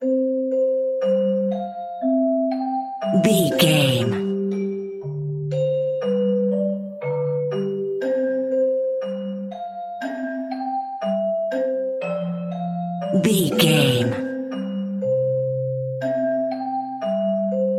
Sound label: celeste, French Celeste; Bobbie Shafto Went To Sea French Celeste